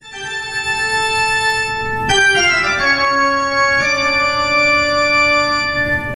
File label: halloween ringtone; halloween special